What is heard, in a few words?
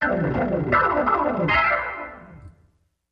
Organ Gliss Down